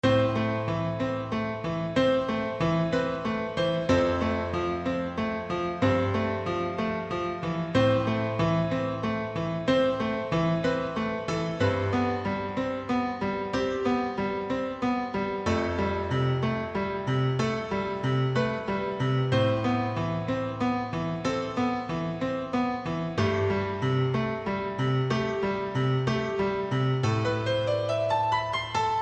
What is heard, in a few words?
relaxing soft piano music; Electronica